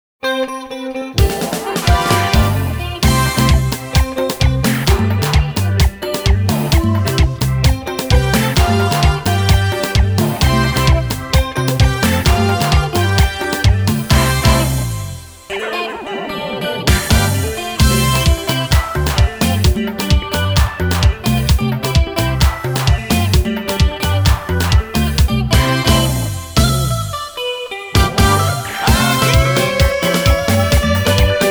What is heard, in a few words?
champeta